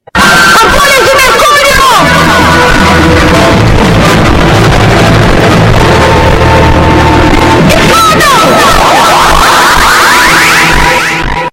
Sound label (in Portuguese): borbulhas